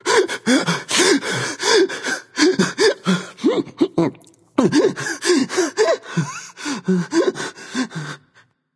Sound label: nv breath; smite; voicepack; ganesha